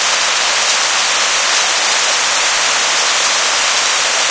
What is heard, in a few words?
water rain med loop; ambient; ambience; rainscapes